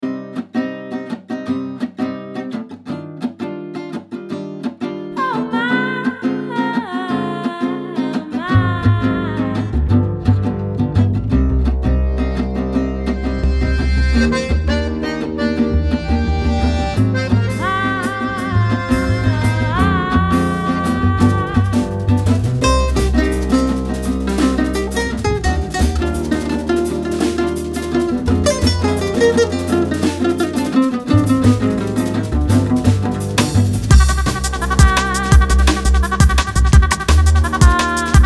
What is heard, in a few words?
Jungle Cafe